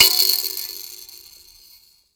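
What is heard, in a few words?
VIB KALIMBA F#4; Heart of Africa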